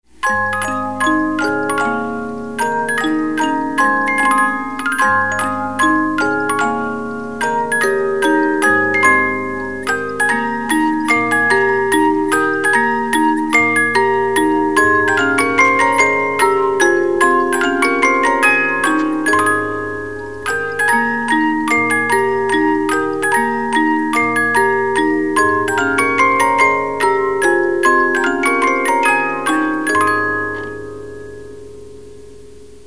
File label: 50-Note Movements, music box; Gaudeamus Igitur